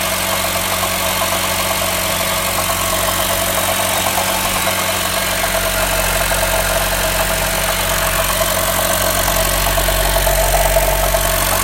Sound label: weird gurgling engine noise starter noise car; 2nd generation ge 08-13